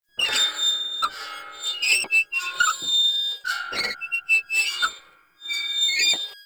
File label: Reverse Wheel Squeak Loop, Motorcycle; Warframe; Vehicles; Motorcycle Ninety Nine